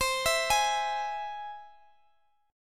C Augmented, Guitar Standard, chord; x,x,x,17,17,16; arpeggiated; capo=2

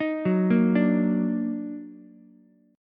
Eb Minor Major 7th Eb down Ukulele, chord; EbmM7; arpeggiated; JGuitar

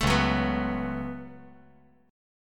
Db 7th Suspended 4th Sharp 5th Db down; JGuitar

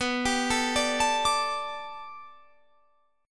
B Minor 7th Sharp 5th B Guitar Standard, chord; arpeggiated; capo 9

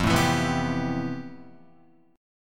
F Major Sharp 11th, Guitar; strummed; JGuitar

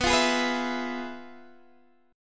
F Minor Major 7th Flat 5th, B

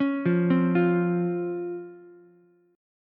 C sharp Major 7th C sharp Ukulele; C#M7 arpeggiated; JGuitar

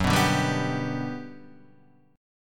G Major F sharp, chord

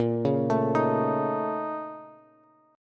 Bb Minor Major 11th Bb, Bass, Bass Standard; BbmM11; arpeggiated; 18,16,19,20; JGuitar

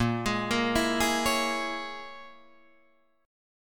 A sharp Minor 7th Flat 5th A sharp, Guitar Drop D

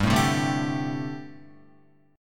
G Suspended 2nd Suspended 4th, Guitar; Gsus2sus4 strummed; JGuitar

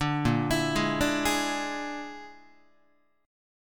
A sharp 7th Flat 5th, Guitar chord; arpeggiated; 5,6,7,6,6,7; JGuitar

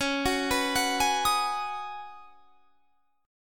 Db 7th Suspended 2nd Suspended 4th; 21,21,21,23,21,23; Db7sus2sus4 arpeggiated

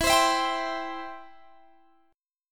E Minor Sharp 5th E down Guitar Standard; strummed; capo 2

